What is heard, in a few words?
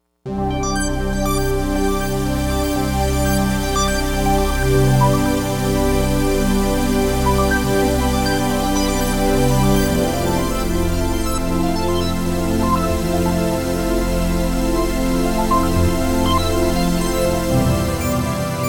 Cosmic Pad